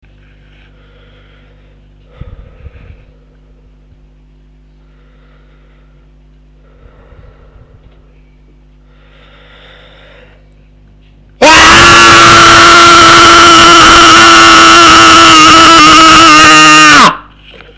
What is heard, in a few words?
ahhh